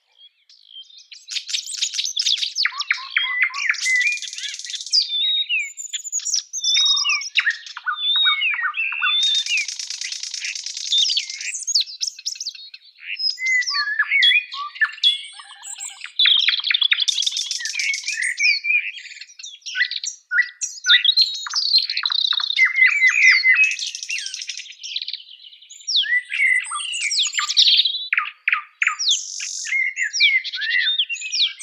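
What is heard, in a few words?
nightingale, blackbird, robin and garden warbler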